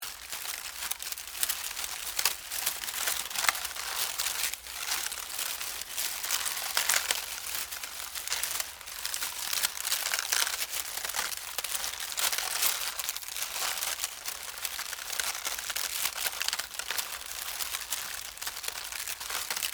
Foliage Movement, Texture, Foliage, Movement, Palm, Frond; Rustling; BlastWave